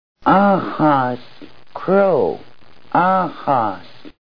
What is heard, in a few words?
crow